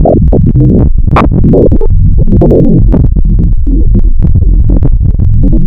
fm synth, wavetable; Adventure Kid

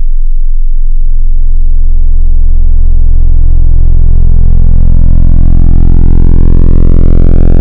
Dist Hard Clipping Extra, Wavetables Distorted

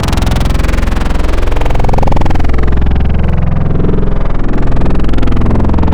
Growl, wavetable

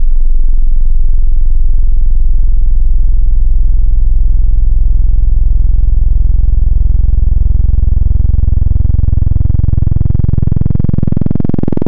Analog PWM Sub, wavetable

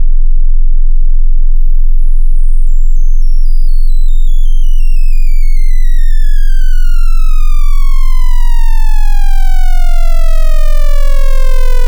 Dist - Downsample, Distorted wavetable

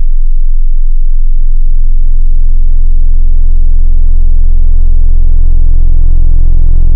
Dist Tape, wavetable

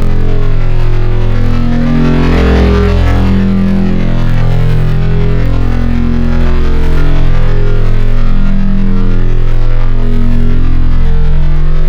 Hyper, Wavetables

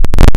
Analog Classic

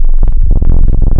theremin, wavetable; Adventure Kid

